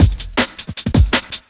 Beat, Drum N Bass

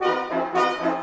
Orchestral Loops; 128 VERTIGO

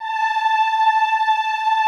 OOH A4, Classical Choir; Best Service; Extended Classical Choir; OOH MIX

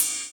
Ride; Equipped Music; Loungin House

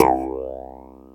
throat, JD8 attack

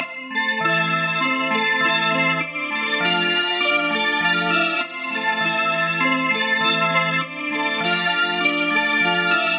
100 A synth, Synths; Charms; Zero-G - Future Wave

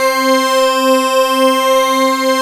PADEX, pad; Best Service Dream Experience; PADDY

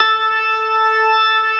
STOPS, organ combos

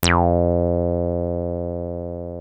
303 D#3, TB 303; Hollywood Edge; Giorgio Moroder Rare Synthesizer Collection

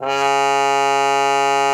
COFF C#3, CUP MUTE; Xsample VOL-3

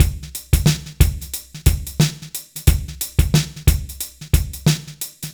BPM 90 AMB

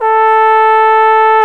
Solo Brass, Trombone, Alto Bone; BRS Alto Bone

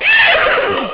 horses; ST-XX Amiga Soundtracker Sample Packs

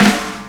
SD HOU, Dance Mega Drums; Best Service

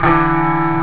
elec gt sustain M2nd; jazz jackrabbit 2

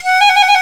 FLT FIFE, British Fife, Fife Trills; Roland LCDP04 Orchestral Winds; 90 s Sample CDs

